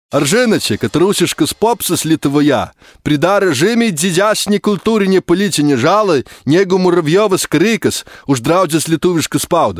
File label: rus pop